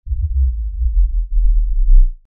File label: Lfe Descend Low Bass; Descending